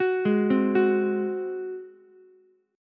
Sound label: Gb Suspended 2nd Flat 5th Ukulele, chord; Gbsus2b5 arpeggiated; 11,8,8,9